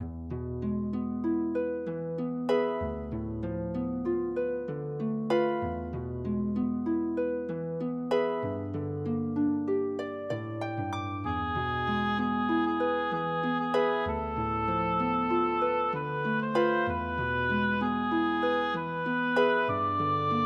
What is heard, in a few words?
for oboe harp; Claude Debussy; beau soir